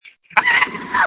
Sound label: Scream